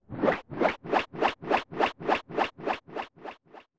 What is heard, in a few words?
Boomerang Fly Fade; The Boomerang flying (full version, edited to fade); zelda; OOT